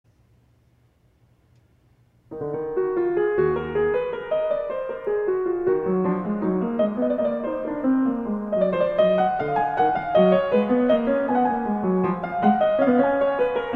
Well Tempered Clavier II- No. 8 in d# minor, Prelude & Fug; Bach, J. S.